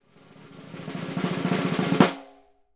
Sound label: SNR, Synclavier Percussion 2 - World & Orchestral